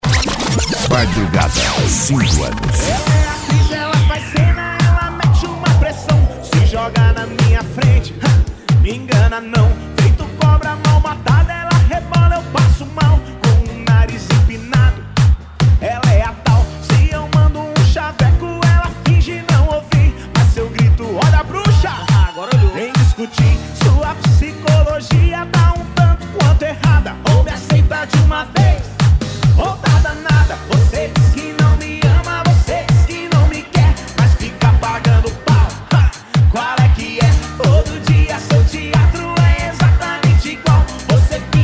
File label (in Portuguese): sertanejo remix e electro dance